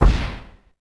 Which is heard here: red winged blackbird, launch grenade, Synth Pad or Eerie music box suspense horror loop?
launch grenade